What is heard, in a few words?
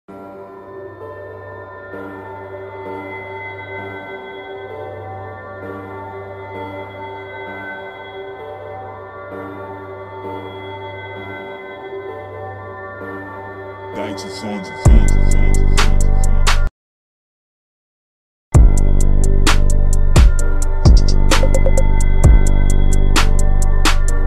Instrumental